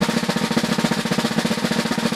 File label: Pullback; Angry Birds Go